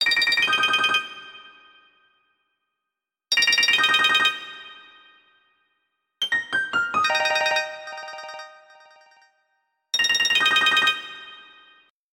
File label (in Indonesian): Nada Dering Nokia Jadul; Tin Can